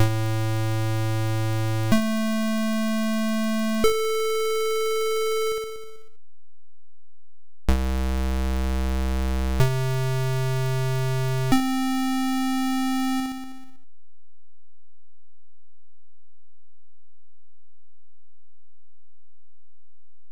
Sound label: Octaves